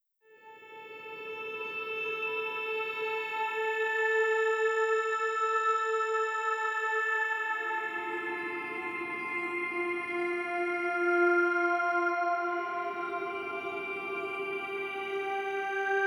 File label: Disturbing Atmosphere; zodiac original soundtrack